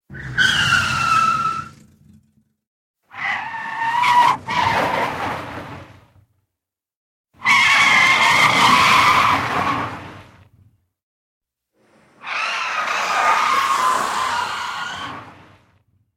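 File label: car drift sounds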